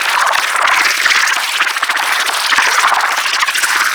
Grineer Speeder Rifle Projectile Loop; Weapons

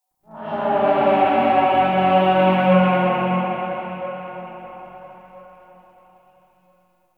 Town Distant Horn Alarm; Ambience; Gameplay; Duviri

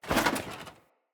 Foley Action Armour Melee Heavy Swipe; Player; Deora Armour

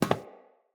Friendly NPC Step Default; Characters; Footsteps

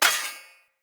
Short Sword Thrown Impact Armour

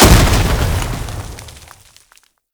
Heavy Orbital Strike Explosion; Abilities; Warframe; Tau; Dax Heavy

